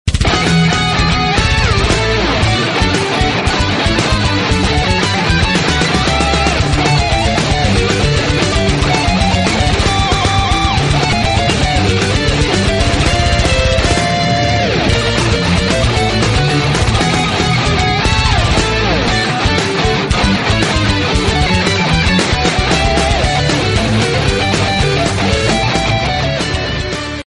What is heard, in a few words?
Mario Bros Metal